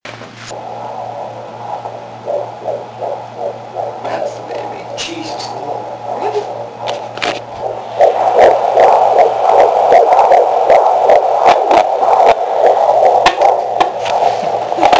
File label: Heartbeat